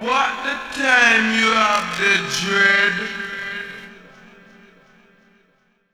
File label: Dred Vox; Jungle; Vocals; Blu Mar Ten - Jungle Jungle - 1989 to 1999 Samplepack